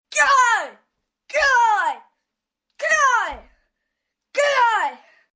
Pain Multi Goah, Grunt, Impact, 1 boy, long, karate hits, human voice, kid, child, groan, moan